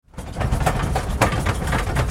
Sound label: error